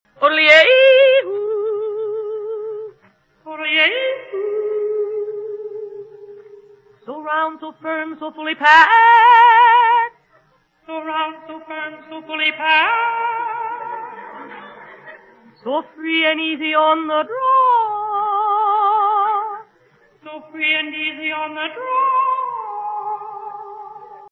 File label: Round Firm Easy Yodel